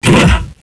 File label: big hit; cstrike